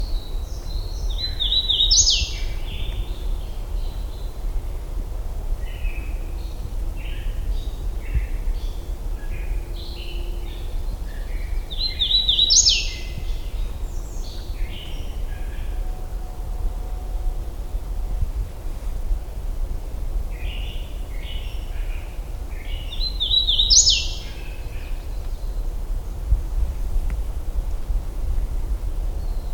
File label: Wilsonia citrina; Hooded Warbler; MI; Michigan Bird Songs